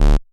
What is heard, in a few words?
bass